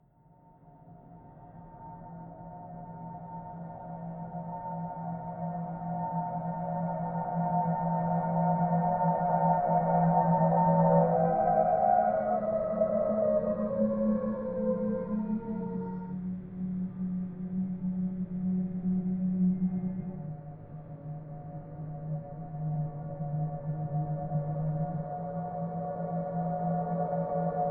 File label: spooky; OneShot Fading Memory